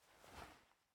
Switch Animation; raw game audio files; Mita; MiSide

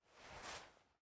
Show Knife; game audio; Mita; MiSide

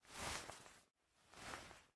Player Move Take Ring; Game rip; MiSide

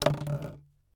Bottle Drop; Game rip; MiSide

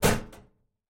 Server Batary Close; Game rip; MiSide

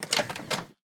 Open Door; Game rip; MiSide

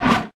Enemy Attack; MiSide

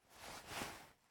Chibi Player Sit; MiSide

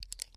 Glasses; MiSide

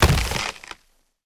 Death Player; MiSide

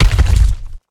Boss Hit; Game rip; MiSide

